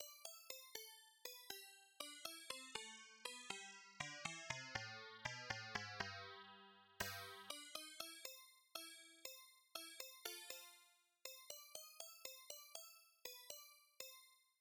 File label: sting